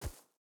wolf footstep grass hard; Rust

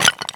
run tile; Escape from Tarkov

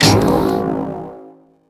adrenaline use

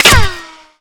headshot; Call of Duty Modern Warfare

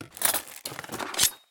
use; Escape from Tarkov

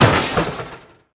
objects, World of Tanks

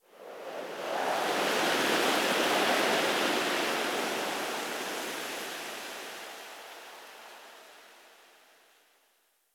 wind leafy whistle

